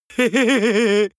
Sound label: laughter stupid; vocals; comedy-cartoon; soundpool